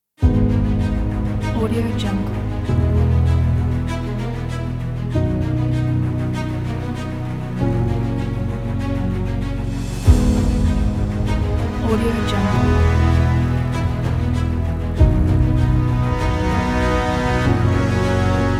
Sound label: cinematic epic